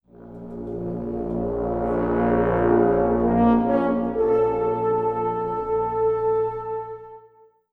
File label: Play Stinger